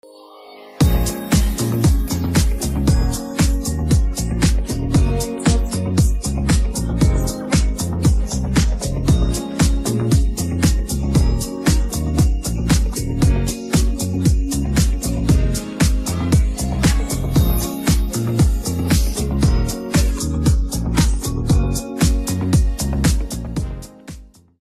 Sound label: Instrumental